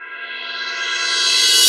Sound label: Reverse Cymbal; Cymbals; Vengeance Samples